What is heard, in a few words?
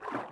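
water run right, footsteps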